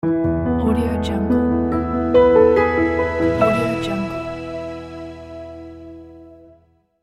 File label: piano soft logo; ulb-production